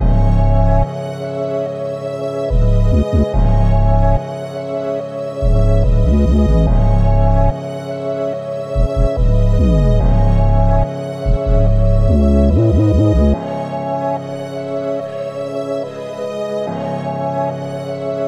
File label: 144 BPM C# Min, Melody, Drill; Cymatics; 2022 Melody Collection